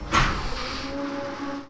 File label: Traction blower start, Train; OpenBVE; Cl313 Silverlink